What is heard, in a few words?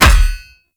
Foley Action Default Body Hit Metal Heavy; Characters; Player